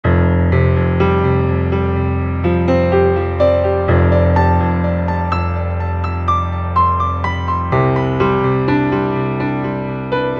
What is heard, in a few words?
piano